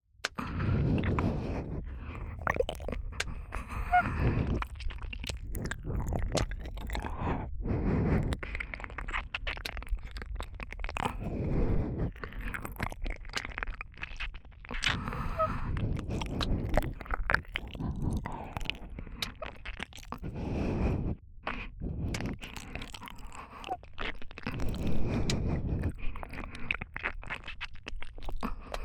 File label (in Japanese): 反転 (右) 耳奥舐めねっとりエッチ, ASMR; 白耳; パンパン系効果音版